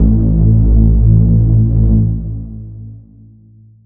One Shot Piano House Melodic Reese Pad A Stab Club